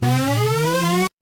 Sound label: retro game power up